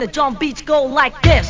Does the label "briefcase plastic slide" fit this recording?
No